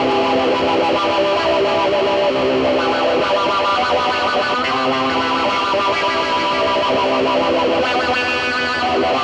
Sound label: Lotsa Wah, Guitars; Rock